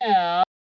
formant filter vox hit f, Synthesized Voice Hits

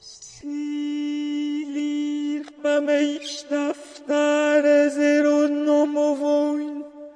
chant mantra pitch corrected; Indian Singing; VOCALS and SPOKEN WORD

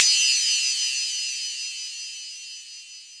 sharp cut through noise hit

